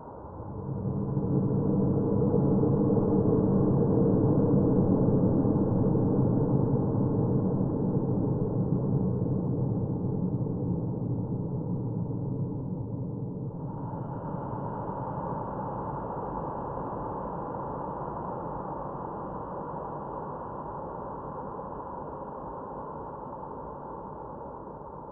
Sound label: Fog Marsh, ambience